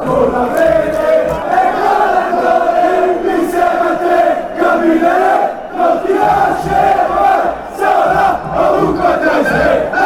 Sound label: with you, fan chants